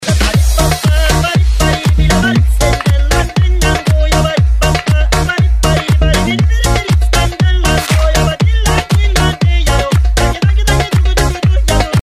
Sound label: polka